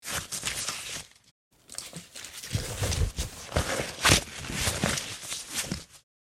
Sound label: Gas mask wearing; military equipment sound effects